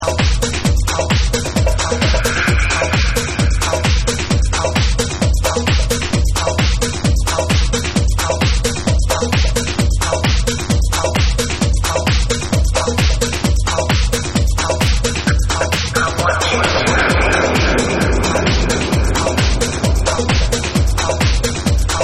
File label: Funky Cyborg Remix